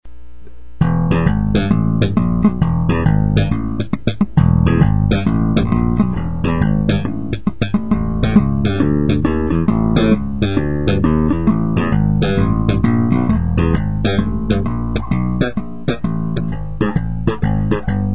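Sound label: tobias slap, bass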